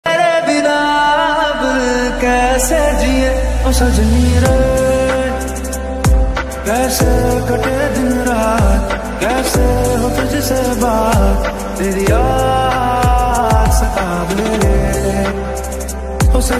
romantic ringtone love ringtone

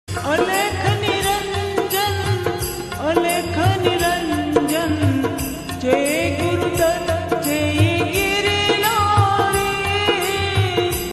Alakh Niranjan Ringtone Slow Reverb

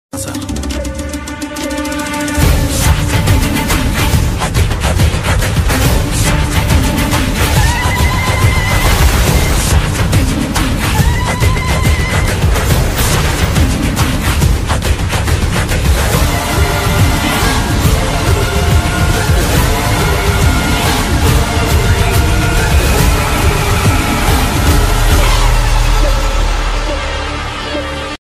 tamil bgm ringtone attitude bgm; maharaja movie; vijay sethupathi

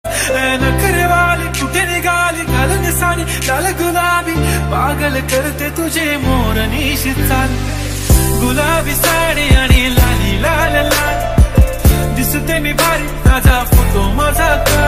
Marathi trending song ringtone

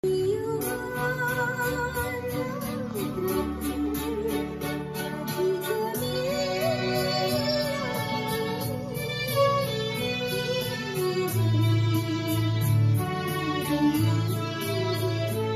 song recording session violins group; aanandhapuram diaries

